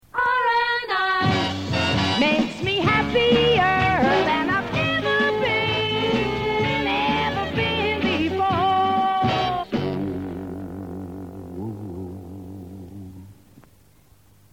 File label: jingles